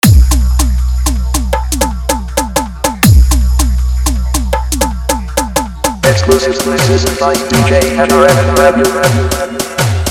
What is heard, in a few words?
Fully Dhollki Bass Rod Dance Remix